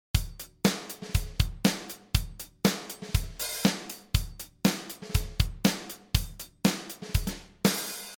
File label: groove